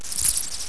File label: Bats